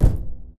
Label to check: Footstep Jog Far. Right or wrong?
Right